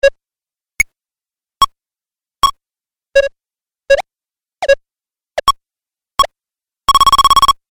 Retro Pong Tennis Collection, Video Game Sound Effects